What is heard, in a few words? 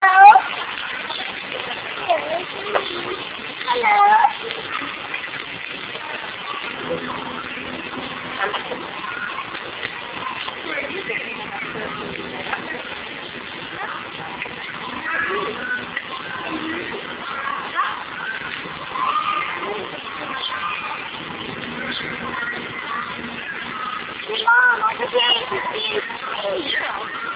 gorilla; zoo; zootape1